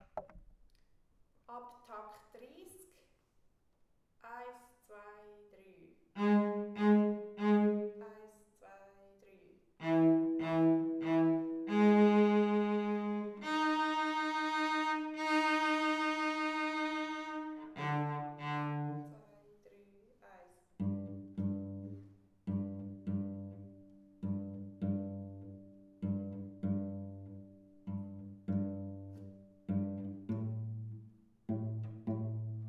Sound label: cello; Der Elefant; Kinderorchester